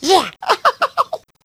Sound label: hunter pounce; huggy wuggy snpc; elmo